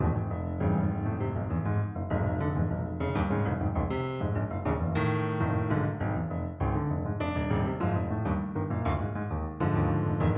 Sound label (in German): klavier miniaturen